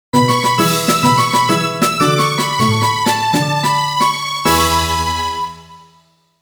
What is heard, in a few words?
Game Over; Magical Drop 2; Saturn